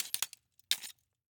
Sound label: Rollerskates, old fashioned, all metal, wooden wheels, clash, strike, clatter, ping, stutter; Eiravaein Works; Vaeyan IV